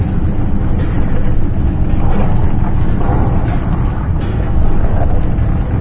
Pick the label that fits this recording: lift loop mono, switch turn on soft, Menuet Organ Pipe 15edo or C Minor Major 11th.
lift loop mono